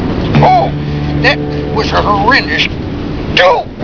crash

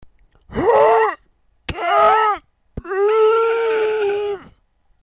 choke

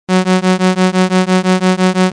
lfo

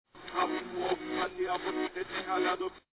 reverse

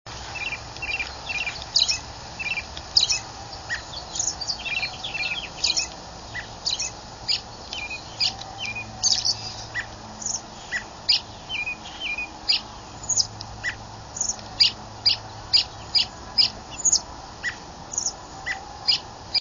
robin immature singing; birdsongs

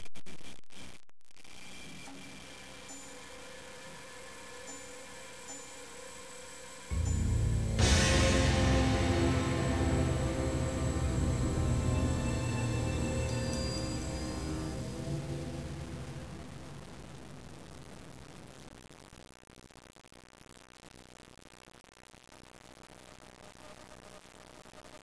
garage

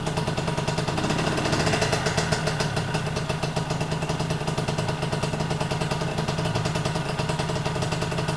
DG idle, pipe

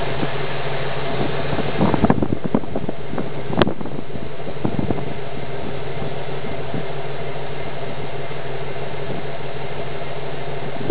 Muffler Old Idle

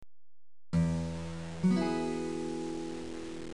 F sharp min 9, chords

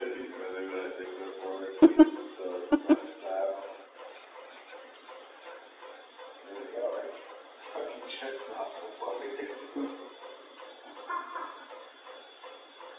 cackle

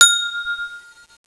chime

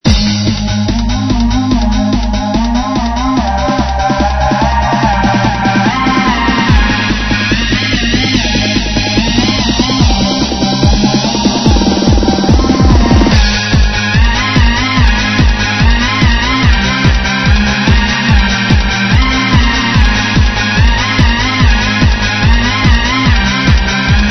Electro Pop

